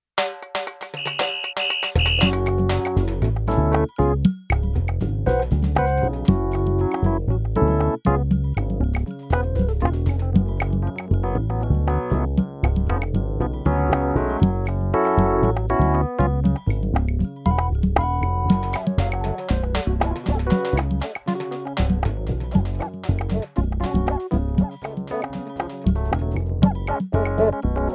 RX Latin Kontakt drum machine based on the Yamaha RX21L